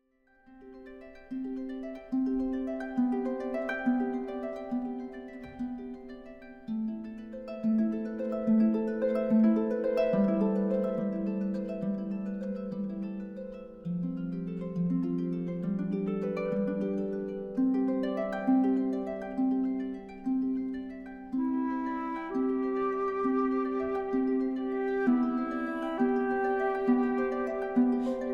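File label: Whispers, harp; fluiten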